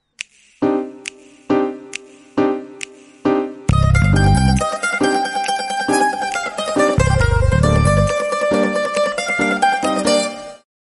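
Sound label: background music; samy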